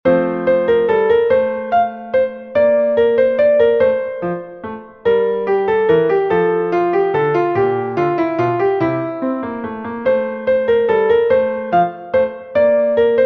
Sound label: Minuet in F